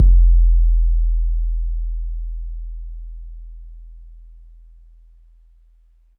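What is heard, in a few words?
bass drum 808 MPC60 Smooth E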